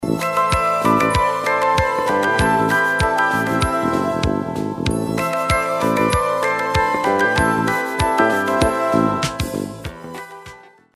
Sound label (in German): wartemusik